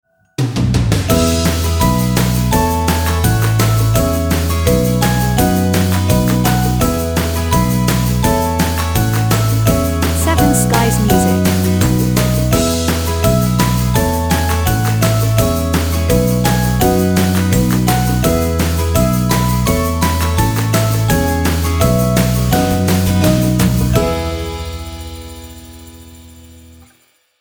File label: Cheerful Promo